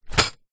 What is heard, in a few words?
tent pegs in pouch down on ground; foley